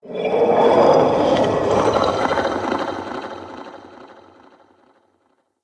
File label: Eerie Texture; Ambience; Red Night